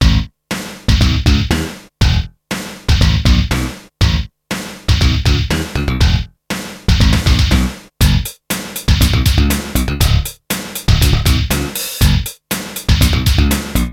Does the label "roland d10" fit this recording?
Yes